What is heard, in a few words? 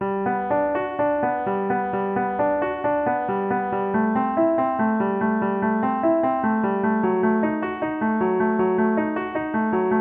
Laid Back Lobby; WarHorse